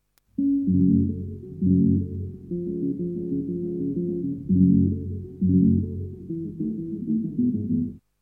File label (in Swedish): klassisk musik nedsatt hoersel